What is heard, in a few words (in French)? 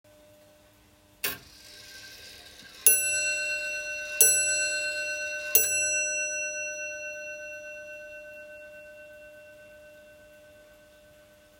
Pendule; La Champagne